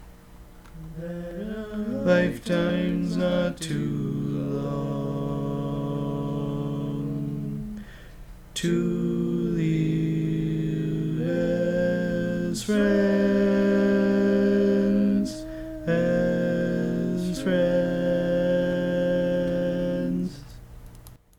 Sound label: Bari, barbershop tags